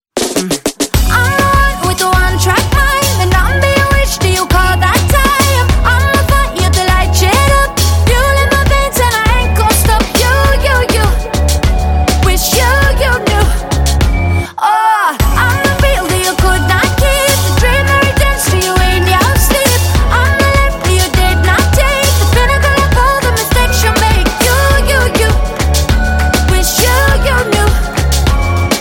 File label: ringtone